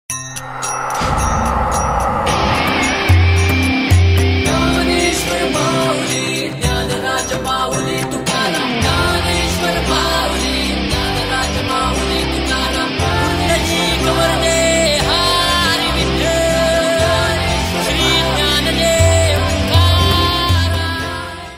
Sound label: pop song; Zee Marathi sa re ga ma pa